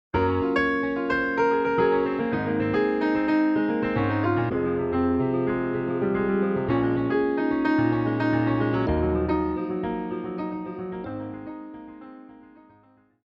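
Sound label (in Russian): фортепиано реклама интерлюдия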